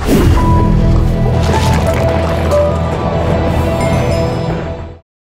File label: intro audio, minecraft cave